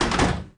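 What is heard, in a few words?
back door close hit, volvo 9900; Freyfurt Beta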